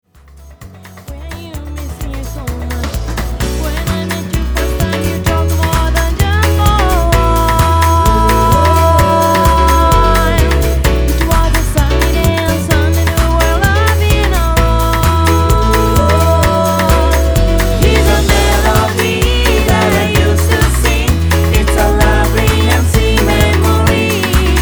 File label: melody